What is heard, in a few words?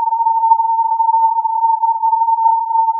filtered input